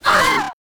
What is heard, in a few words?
war damage